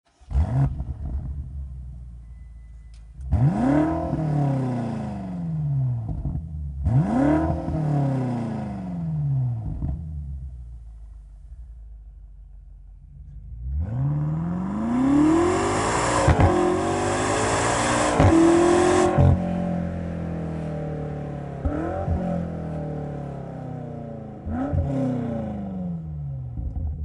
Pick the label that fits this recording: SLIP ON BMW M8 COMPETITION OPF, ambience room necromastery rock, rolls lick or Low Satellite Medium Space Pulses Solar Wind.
SLIP ON BMW M8 COMPETITION OPF